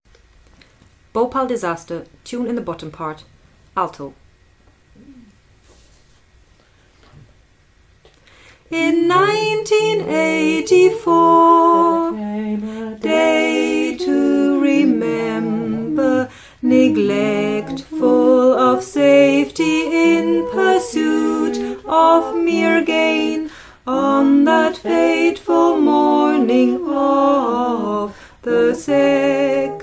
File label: alto